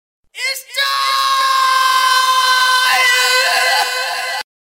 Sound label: Message